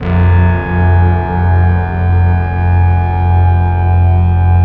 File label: BASS, Drum & Bass 160 BPM